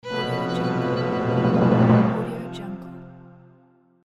unhappy orchestral

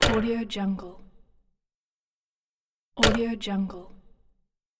user interface mechanical selection

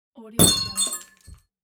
wine glass crash

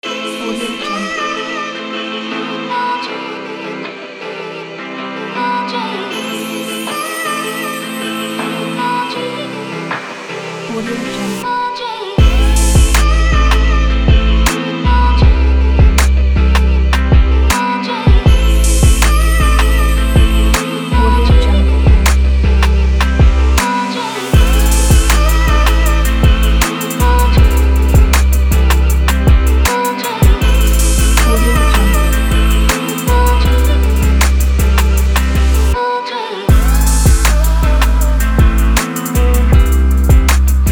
modern guitar trap beat

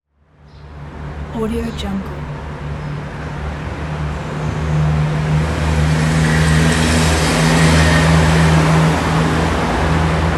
city ambience with traffic; chicasound

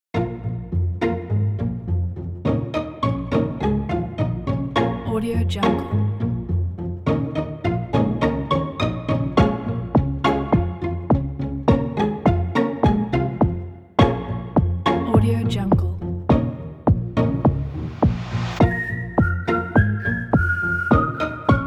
jumpy joy

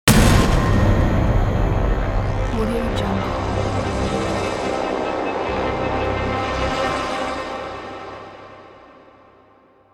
epic hit trailer braam

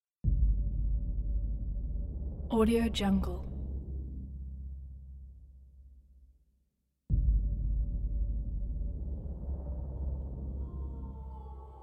battle trailer

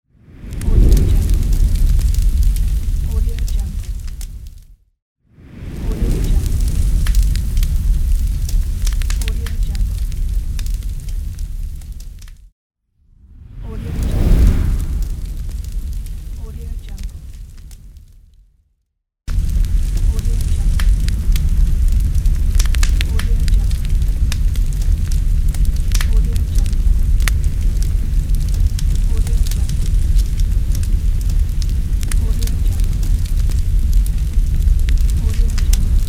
fire

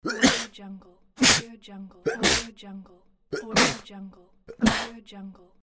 sneeze

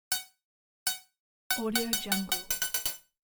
scifi spaceship interface missile lock

